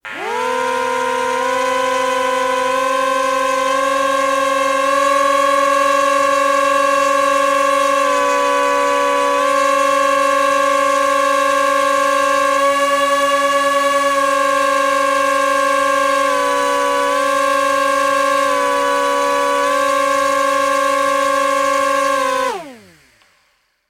toys drone or rc plane motors whir adjustments left right